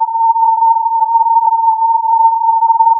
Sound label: filtered input